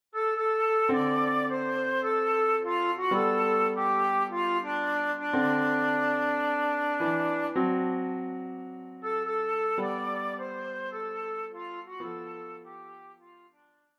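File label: flute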